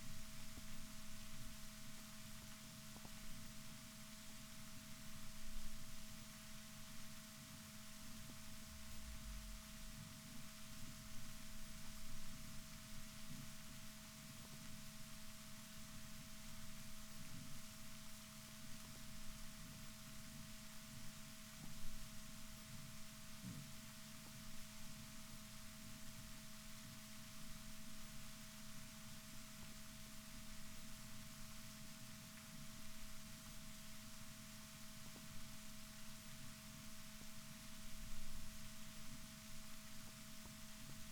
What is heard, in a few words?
rorquals negatif, Balaenoptera Physalus Chile